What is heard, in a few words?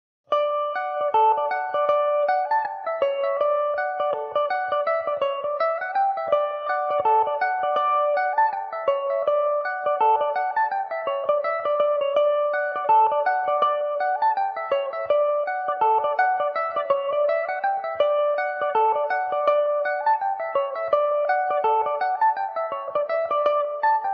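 D major revelation, irish mandolin; The Green Garters; Revelation Jaguar electric mandolin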